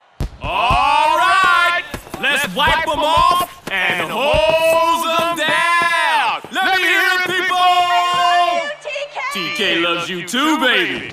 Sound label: gameshow loss